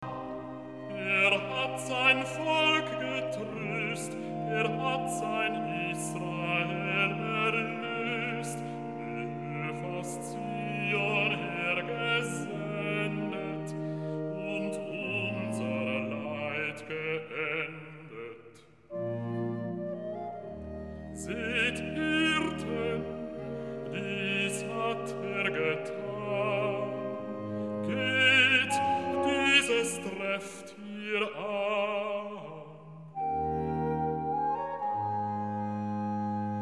Recitativo (Basso); Er hat sein Volk getrцst'; BACH; Christmas Oratorio

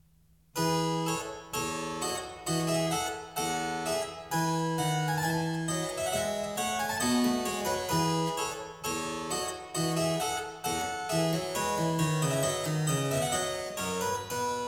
E major, Gavotte; French Suite No.6; BWV 817; BACH; CLAVIER WORKS; FRENCH SUITES

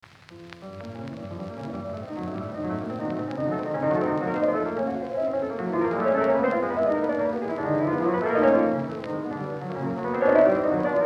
Sonata in Bb min - Fourth Movement, piano; Chopin; Friedman; Piano Sonata No.2, Op.35

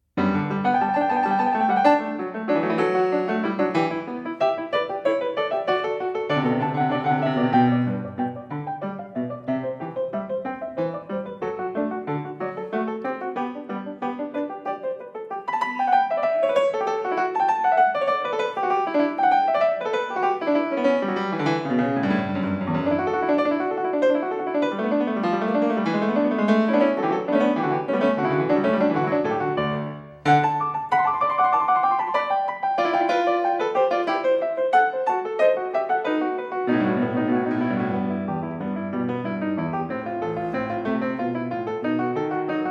Bach Goldberg Variations a 2 Clav, PIANISTS

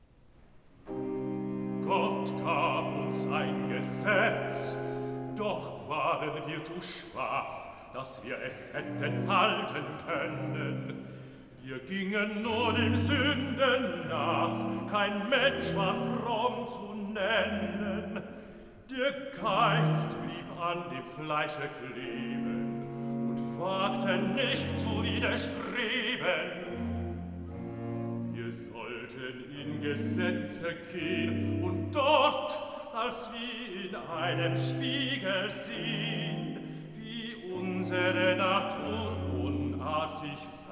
Recitativo (Bass); BACH VOCAL; CANTATAS; BWV 9; Es ist das Heil uns Kommen her; Gott gad uns ein Gesetz

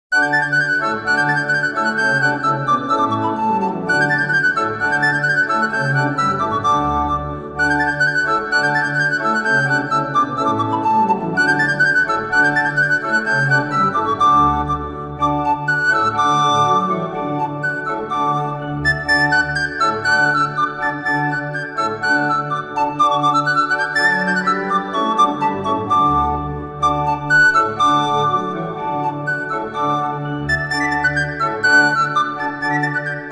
Tambourin, Pan flute music; Tambourin I + II; M.R. Delalande

